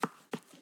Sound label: ambience room butchery food ready